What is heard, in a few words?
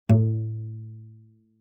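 Cello pizz fortissimo sul C A2; MIS Pitches - 2014; Strings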